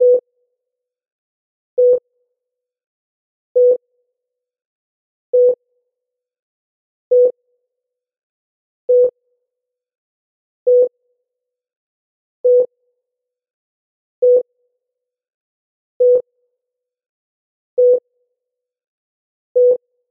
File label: medical heart rate monitor